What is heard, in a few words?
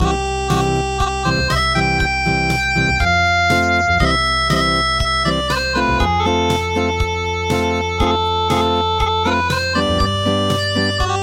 Acoustic, traditional; The Whisper Of West Wind